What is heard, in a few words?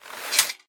holster, combat, weapons, swingable; default swingable